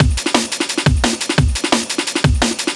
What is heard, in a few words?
DNB BREAK